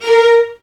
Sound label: violins; ILIO; Synclavier Strings